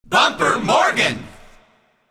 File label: jingle